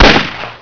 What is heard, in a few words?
Shoot; gamerip; Miner Max